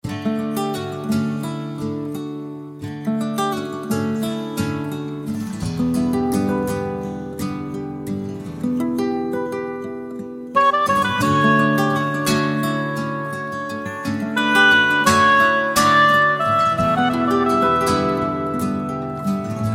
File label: guitar ringtone